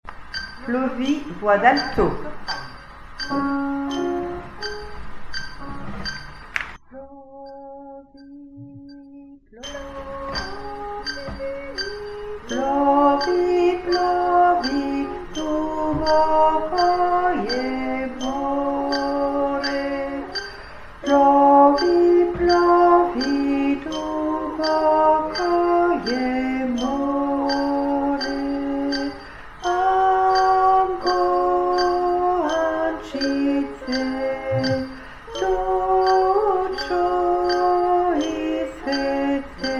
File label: Alto